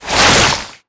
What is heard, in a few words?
poison projectile passby